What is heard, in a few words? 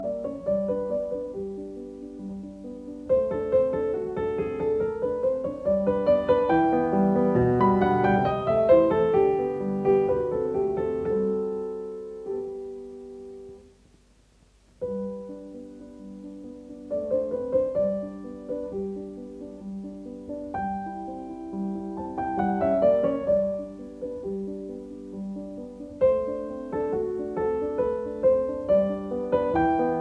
Piano Sonata No 16 in C major K 545 Sonata facile II Andante; Lili Kraus